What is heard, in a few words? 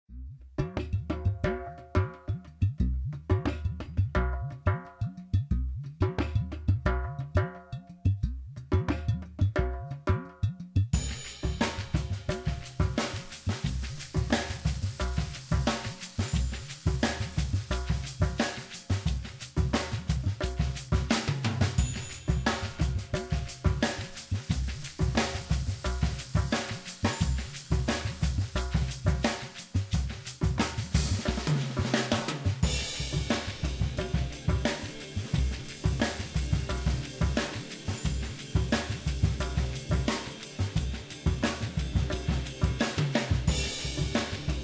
music; Platformer